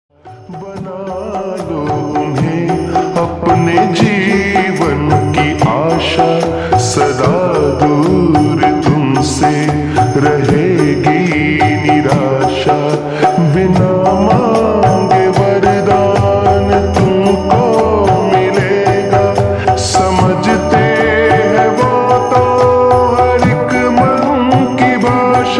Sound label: Slowed Reverb Ringtone